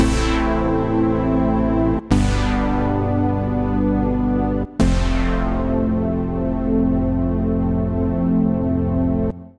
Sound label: SynBrass (Am) 100bpm; Music Loops; Kershaw; Ableton; Singularities